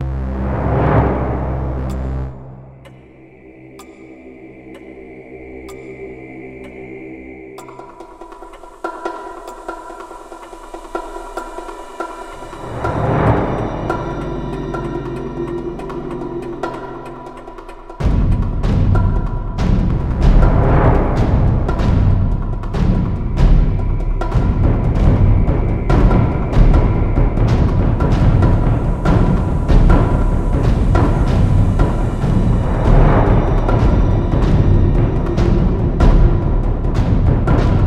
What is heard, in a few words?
cinematic drums; Dragonfire